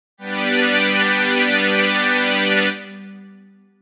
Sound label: Analog Slowstring; jup8